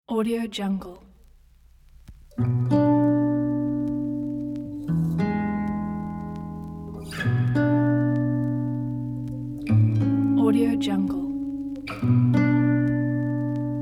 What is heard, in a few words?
background guitar; prestosound